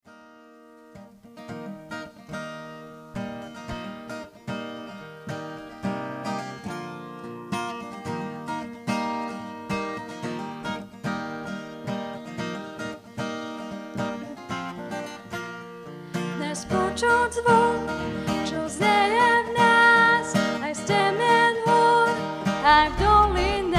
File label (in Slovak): Chvaly